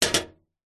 household mailbox metal close; zapsplat

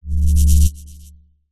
science fiction power surge short hum

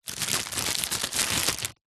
foley plastic pouch small handling; zapsplat

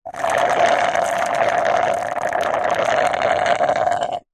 food drink milkshake thick suck dregs straw slurp; zapsplat

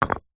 foley brick set down ground; zapsplat

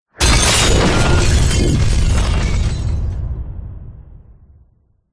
explosion boom big heavy distorted breaking glass

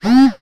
technology cell phone vibrate short

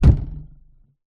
foley balloon hit impact boing

